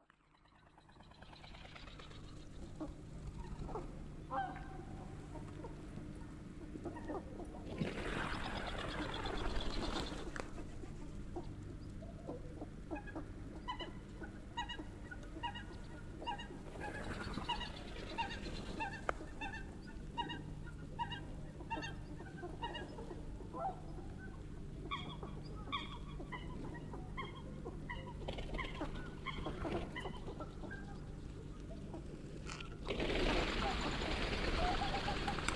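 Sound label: northern pintail; onagagamo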